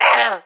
Pecheneg Gray Damage

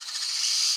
Amb Env Bird-Skreech; viewfinder

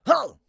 Pirate Swordman; gamerip

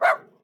Dog Shiba; DogShiba2

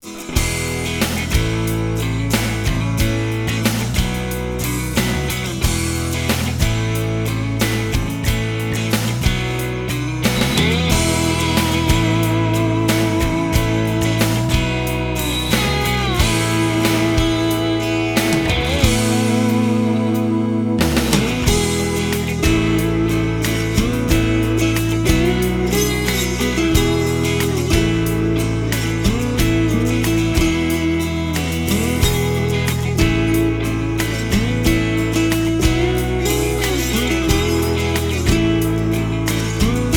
Ending; 18 Wheeler American Pro Trucker

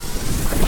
Rewind Jump

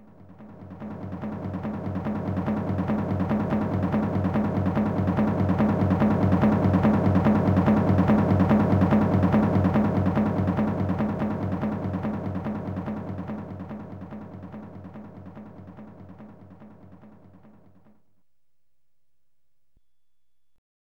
Drums; Sensible Soccer European Champions v1.1; CD-32; Amiga

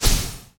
Butterfly Blade

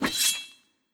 Goblin Blade Slice

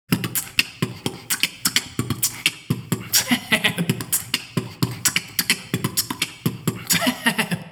beatbox; 16beats

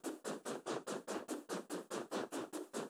Fly Loop

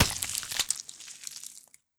Impact Gore

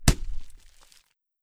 Bullet Hit

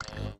Weight-Plate Full; viewfinder

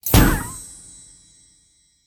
Magic, Cartoon

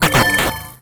Bulb Button

